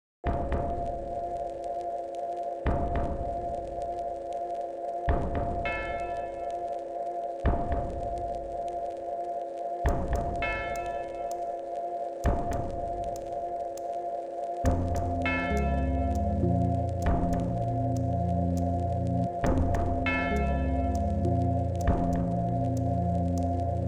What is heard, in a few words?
ambience